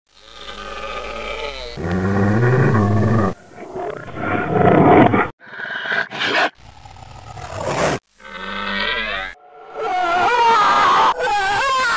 Reverse M Dickie Pain Sound Effects; Extra Lives

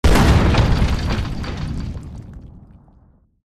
Crane Impact Wall; The Unfinished Swan

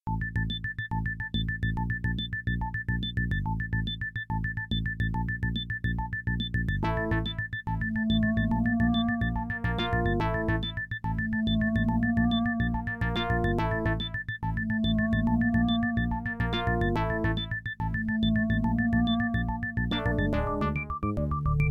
Rainy Forest, Adlib; Lollypop; MS-DOS

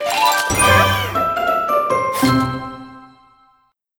Crunchy Finish

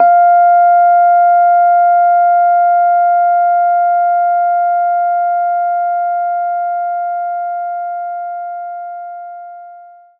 Rhodes F4

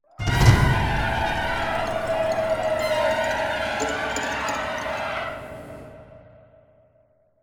Music Stingers stinger

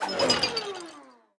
Winch Down

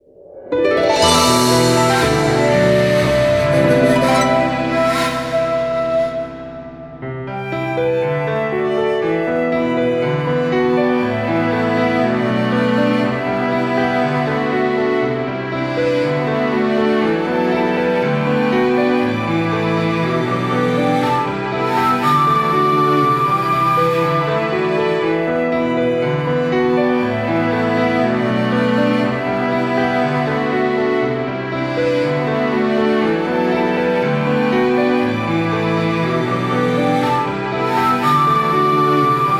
Battle Victory; Naruto Shippuden: Ultimate Ninja Storm 4

